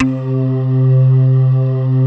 JV PADS; Pro-Rec - Synthsonic

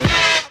D2 STAB; Zero G; Man Machine

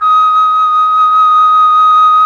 FLUT1; ILIO; Propeller Island Legendary M400

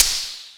HAT; E-MU Formula 4000 Series Vol. 1; Hip Hop Nation

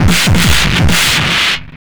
MD 909 150, Hard & Loud Techno

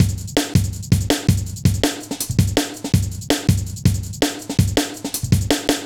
Heavy Live B, Drum Loops, Jungle

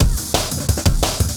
layered break, breakcore

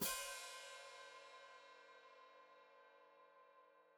Hat Closed Open, Hi-Hat

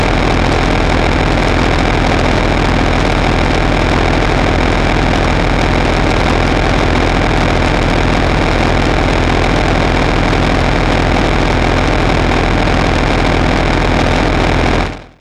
Engine, FM; Polyend

